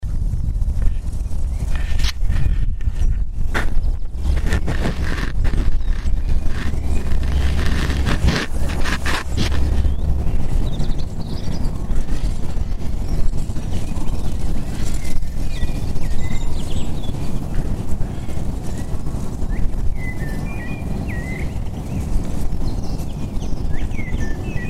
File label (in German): seegeraeusche